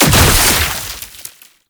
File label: Boss Ranged Weapon Projectile Explosion; Enemies; Abilities; Warframe; Oraxia